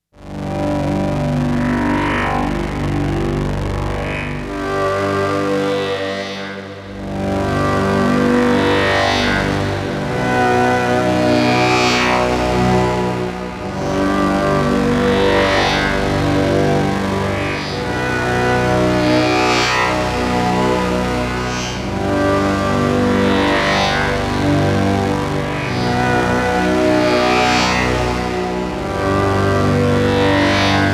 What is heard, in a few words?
MicroMonsta 2 Organ